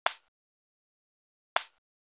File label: Clap; hydrogen